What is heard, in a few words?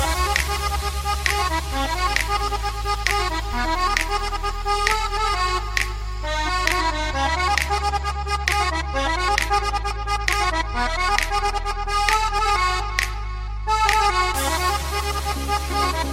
Romanian Mix